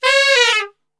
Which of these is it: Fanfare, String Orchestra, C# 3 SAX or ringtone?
C# 3 SAX